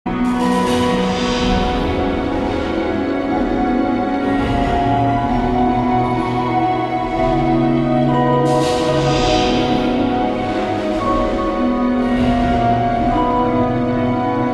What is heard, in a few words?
tone